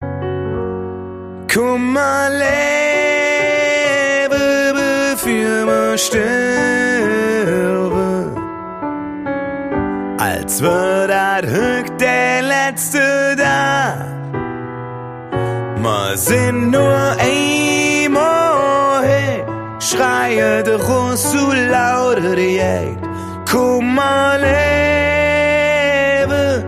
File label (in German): am klavier